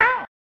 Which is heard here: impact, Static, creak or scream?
scream